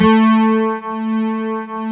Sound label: FM BASS; AKAI S-Series CD-ROM Sound Library VOL-7